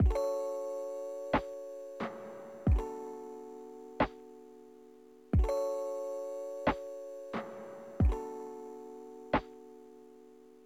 Relaxing Lofi Beat

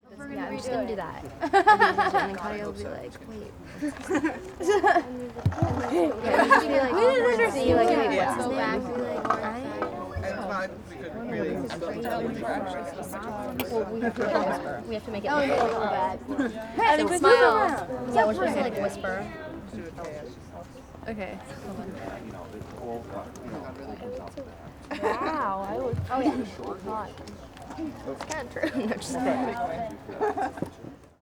Middle School Girls Walla Exterior

